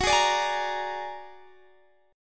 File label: Bb Augmented 7th Gb